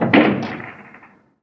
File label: Fall